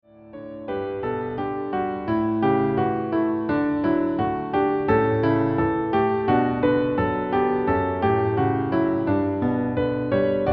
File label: Canon in D Major; Pachelbel J.